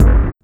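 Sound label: Darkness Kick, drum kit; Sonic Foundry (Sony Creative Software); Esoterik Beatz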